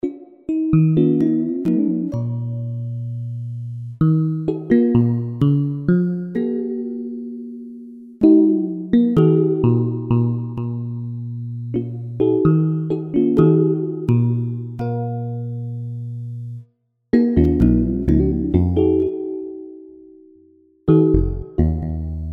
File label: drum